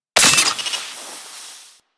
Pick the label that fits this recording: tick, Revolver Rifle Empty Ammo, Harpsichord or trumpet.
Revolver Rifle Empty Ammo